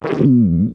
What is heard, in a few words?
eating sick; MiniGames